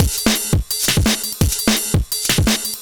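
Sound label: Drum and Bass Beat 170, drumnbass 170bpm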